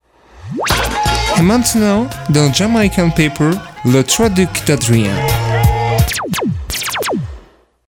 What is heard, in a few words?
jingle